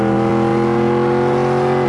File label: engine stall, racing